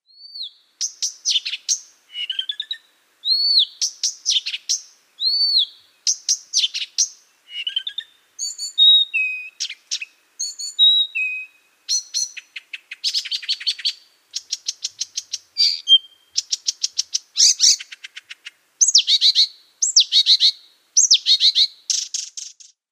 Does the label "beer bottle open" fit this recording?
No